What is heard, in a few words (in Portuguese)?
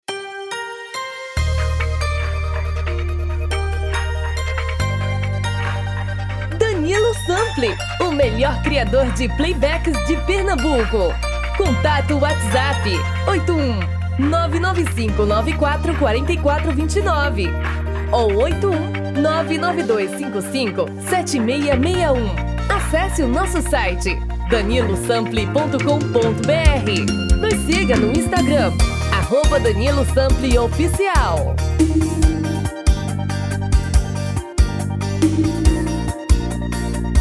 Vers Brega